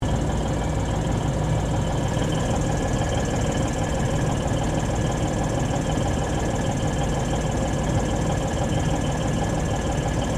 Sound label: Ambience Railway Station General; General Ambience at Southern Cross railway station; Train Station Sound Effects; Foundation1